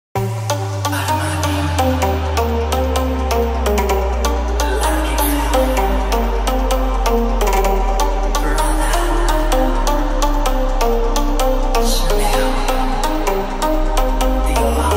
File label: new arabic dance